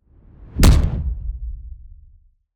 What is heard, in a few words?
weapons sci fi explosion bend reality